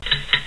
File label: Tick Tock (Loop)